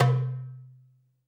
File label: DARBUKA, AFRICAN DARBUKA